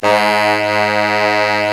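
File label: low brass, fat pop section; Roland L-CDX-03 Disk 2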